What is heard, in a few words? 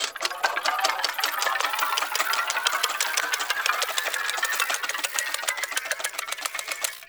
Gas Arrow Countdown, Weapons; Warframe; Infested; Derelict Cernos Prime